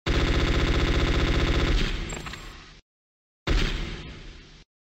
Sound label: firing